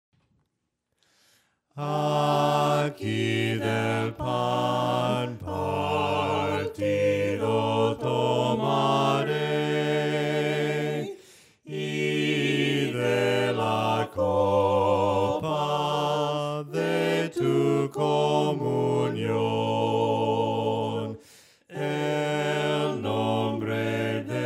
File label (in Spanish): AQUI DEL PAN PARTIDO TOMARE VOCES